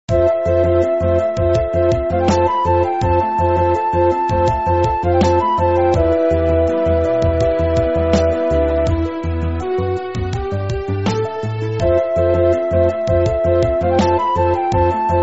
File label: dangeon, bgm; maoudamashii; arrows